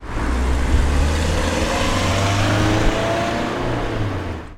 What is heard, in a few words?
Car